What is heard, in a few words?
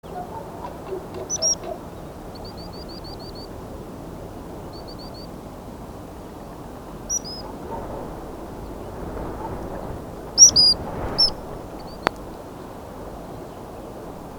Sandpiper; آبچلیک